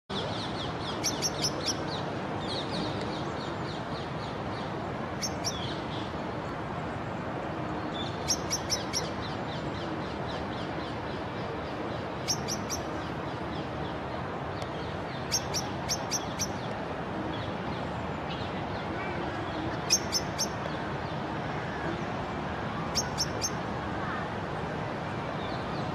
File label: Turdus merula female, صدای توکای ماده برای جلب جفت نر